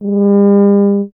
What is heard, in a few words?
BRS TUBA, Solo Instruments; Miroslav Vitous - Solo Instruments VOL-2